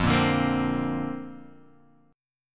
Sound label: D-Minor 7th Sharp 5th F, chord